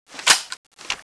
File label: ak47 draw